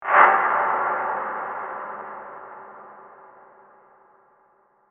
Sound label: Drone Scrape Reverb, Production, Element, Drone, Scrape, Reverb, Erie